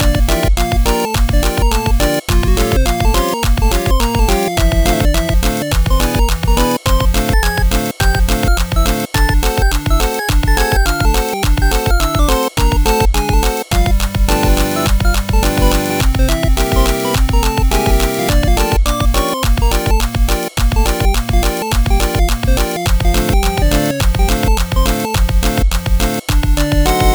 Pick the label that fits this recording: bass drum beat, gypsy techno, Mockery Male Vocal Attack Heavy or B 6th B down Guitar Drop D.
gypsy techno